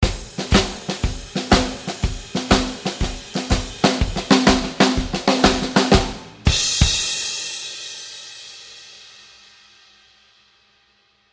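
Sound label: sounds with an effect of completion, ending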